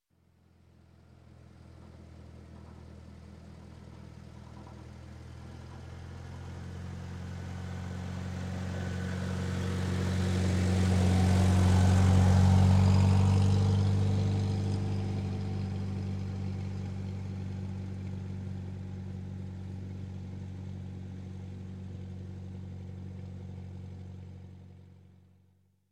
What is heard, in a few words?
Tractor, Gas Pass By, Slow, Industry, Farm; Sound Ideas 2000 Series